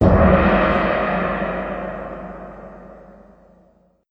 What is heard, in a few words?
gong sound; podcast